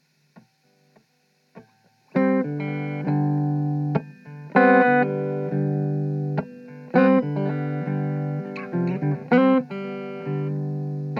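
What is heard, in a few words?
G 100 guitar riff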